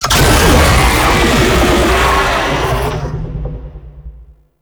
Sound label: Infested Vocal Shield Up; Warframe